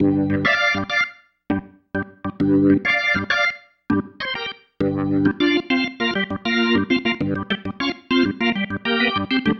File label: Pattern, Organ